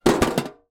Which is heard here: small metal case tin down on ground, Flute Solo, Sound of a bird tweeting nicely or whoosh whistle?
small metal case tin down on ground